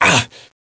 pain, player; SIN; blade

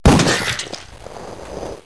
huhn shot

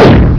Exploding Block; Greebles 1.0

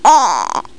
BABY BEE

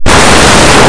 armor

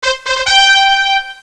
horn; Princess and the Pea; SoftKey

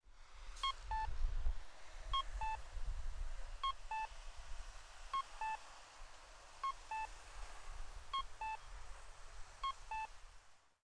SIGNAL, commercial

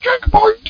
checkpoint; Driver

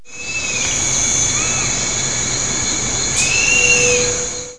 Marsh Ambience (Day); Wild and Wacky Sound Software; 1,000 Sounds; Outdoors & Nature

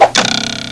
Trap Arrow; Mythos

